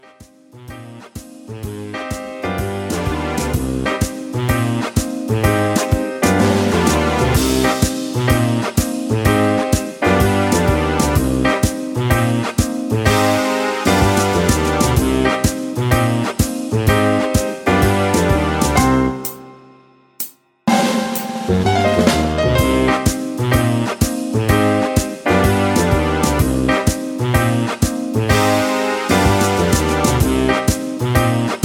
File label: Instrumental No Melody